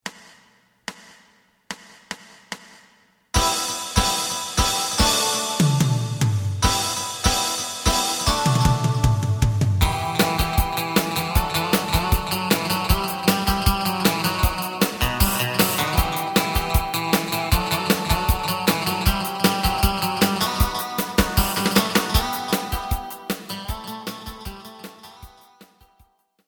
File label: bass